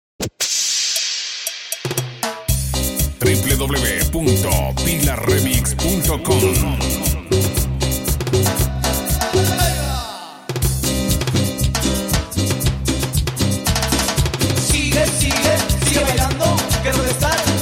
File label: Intro Perc, 118 Bpm